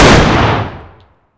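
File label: fire distant 338lm rifle interior; gmod; arccw uc commons attachments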